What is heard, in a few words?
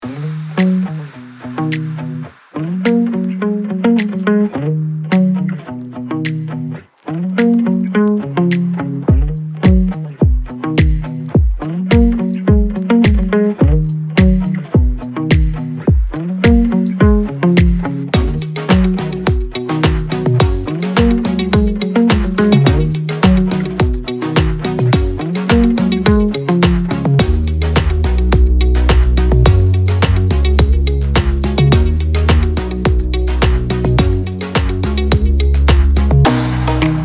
EDM